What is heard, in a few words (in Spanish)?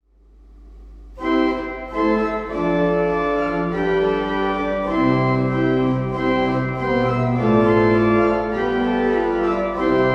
flautado octava, órgano